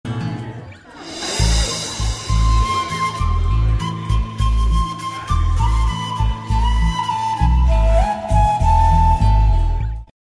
Melodious Flute; Anwar; Malayalam

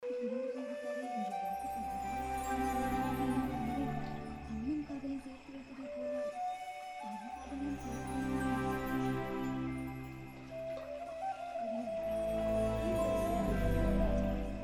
Flute bit, BGM Ringtones; Kochadaiiyaan; Tamil

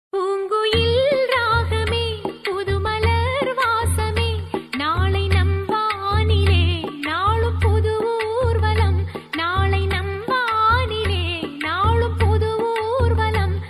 Female Version; Tamil

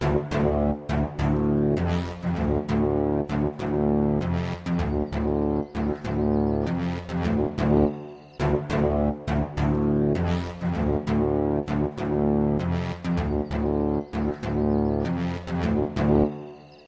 BGM Ringtone; Aadu 2; Malayalam

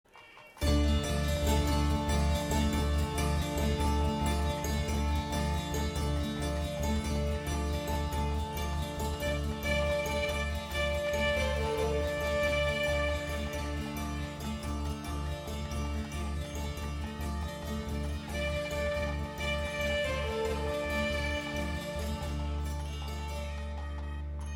Cues In Jingle; Dhaam Dhoom; Tamil

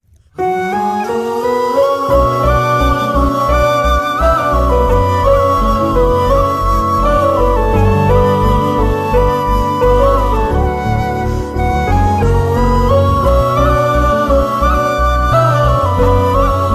Instrumental (Background Score); Oh My Kadavule; Tamil

